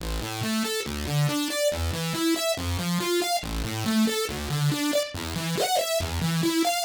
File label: synth tekno 140 A; Techno2; Keys